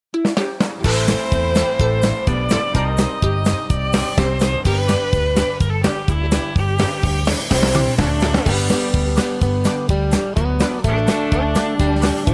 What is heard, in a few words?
harmony sample